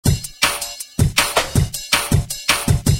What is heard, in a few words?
break